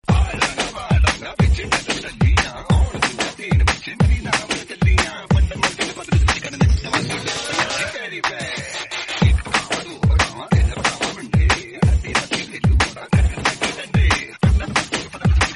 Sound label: Hindi Rapping